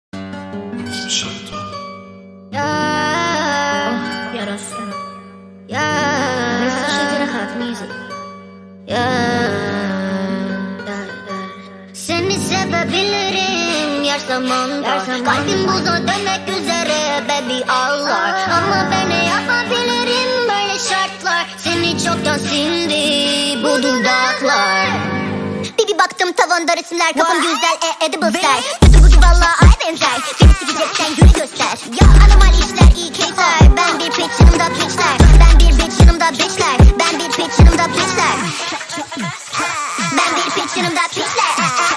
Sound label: speed up